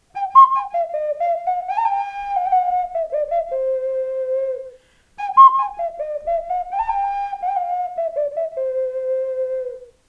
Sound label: ocarina